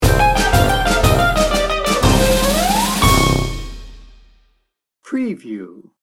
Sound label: sting bumper